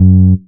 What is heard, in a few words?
Syn Bass; Equipped Music